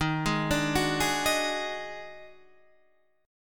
D sharp Minor Major 7th Double Flat 5th D sharp Guitar DADGAD